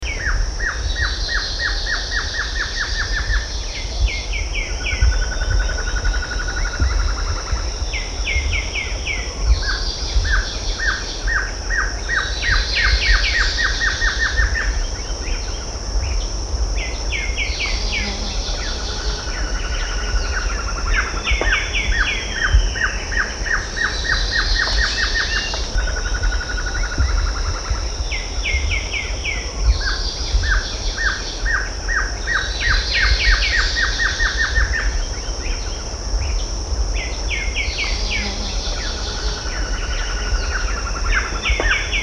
Glossy Antshrike

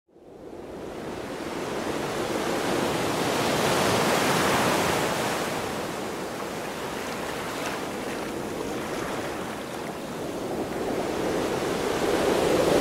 Sounds of the Cliffs Waves

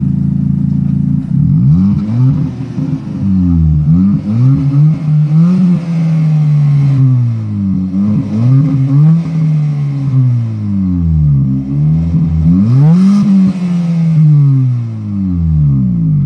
Dena exhaust

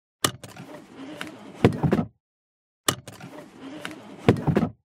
car belt; بستن; کمربند ماشین

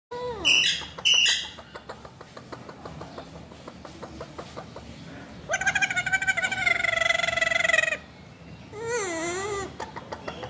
Alexandrine parakeet; شاه طوطی الکساندر

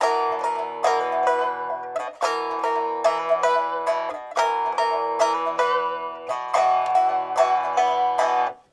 Em Rythm Pipa Lute, 110

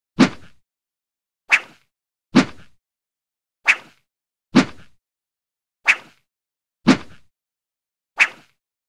Knife Throw Sound

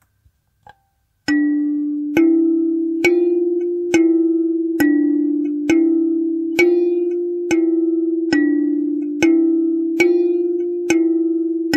harpika

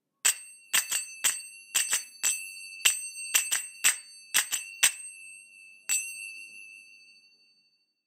finger cymbals

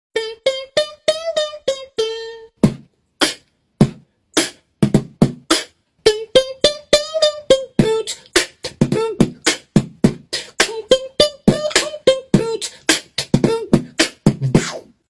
beat box; بیت باکس

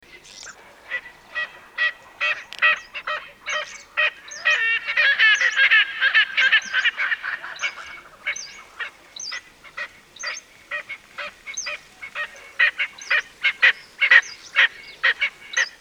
Erckel's spurfowl

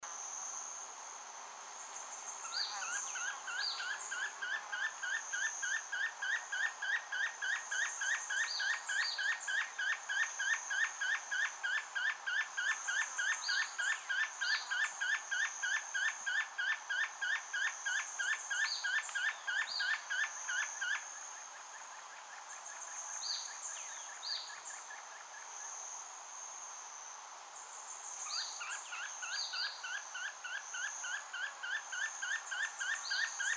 Spotted puffbird

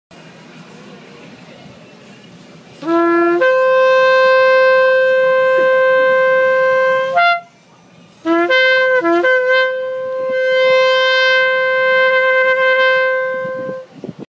shofar

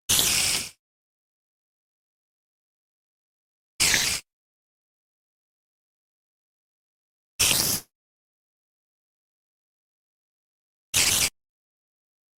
spider; Minecraft; ماینکرافت

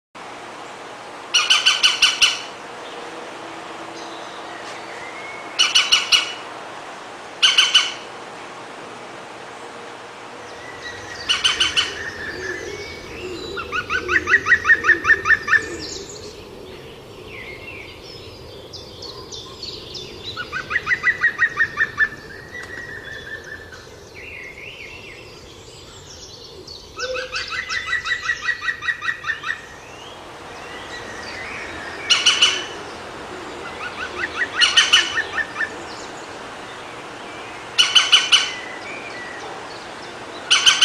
green woodpecker sound

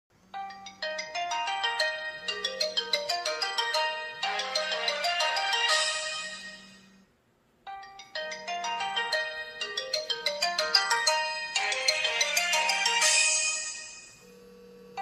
Pantech, زنگ گوشی

